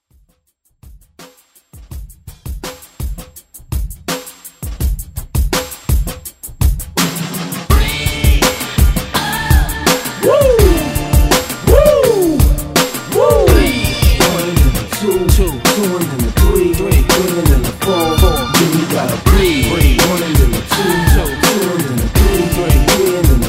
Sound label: Throwback Redrum